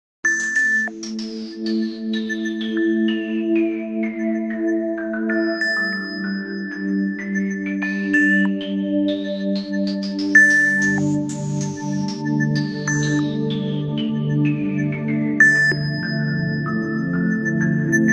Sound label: Clock alert; Nokia Ringtones; N79